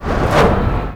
bash; cstrike; mw2

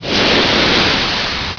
alien; co avp pyramid

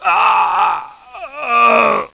death; fgrunt

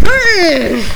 mmmmph

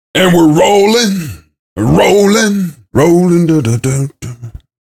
cheer; svencoop; voice commands; SeriousSam

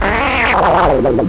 slime pain; svencoop; sc psyko

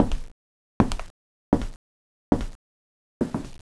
steps; beerdog

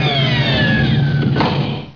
cryo end; Zenmaster

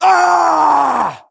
Horseman Wound Crit; svencoop; keenhalloween; horsemen

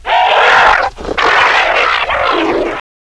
catacomb; gearbox

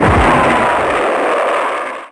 Launch; svencoop; portal house

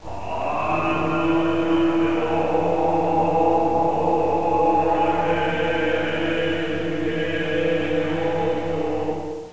monks; cstrike

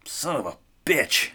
pain, bodyguard; svencoop

